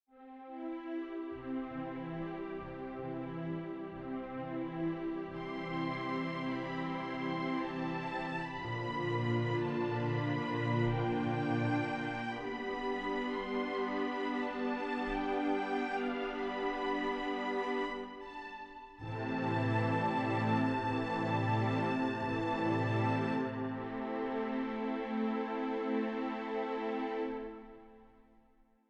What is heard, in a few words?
love theme orchestral